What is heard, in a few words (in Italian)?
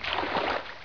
Acqua, natura